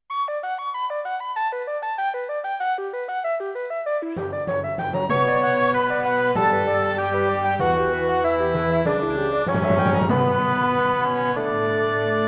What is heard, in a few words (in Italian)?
SOSTENUTO E DECISO, Musica Sacra; Antologia di Musica Sacra Vol.2